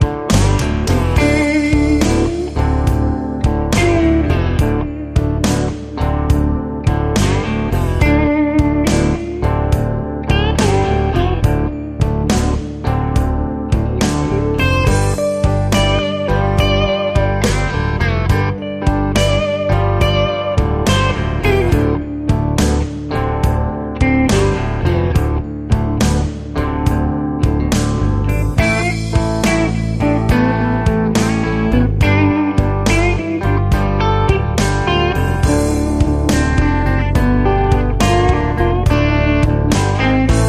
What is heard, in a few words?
Muddy River Blues